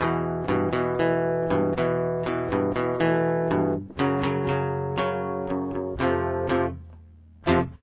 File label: chorus, guitar